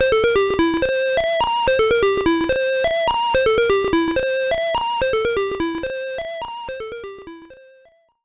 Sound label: Miss; Oil Panic; Game & Watch Gallery; GB